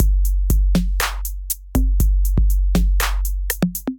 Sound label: 808 Kit, Drum Machines; 808 Fairweather Kit; Ableton; Factory Packs; Drum Essentials